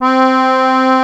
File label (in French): accordeon; East West - Global Sounds